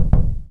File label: drywall footstep, plaster; Half Life 2